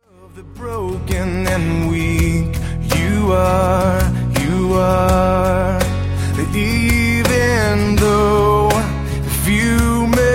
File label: acoustic